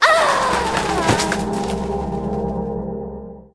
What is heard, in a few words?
dead, monster